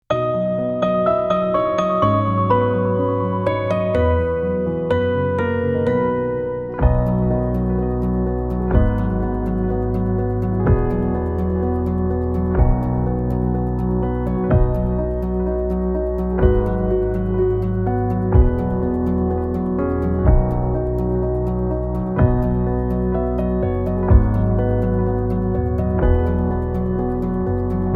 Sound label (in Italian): pianoforte; meteo